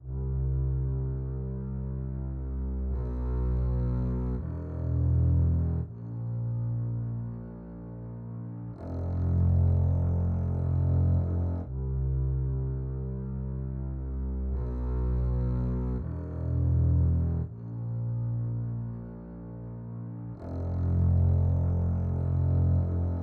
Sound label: basses